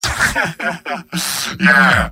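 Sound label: positive vocalization, male; tf2; garrysmod; mvm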